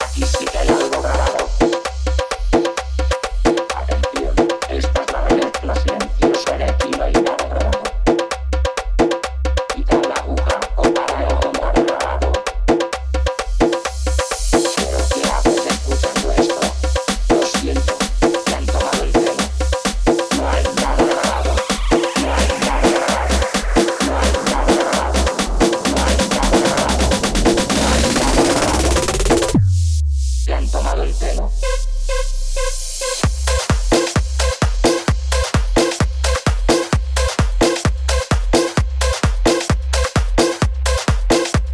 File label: NO HAY NADA GRABADO 130 BPM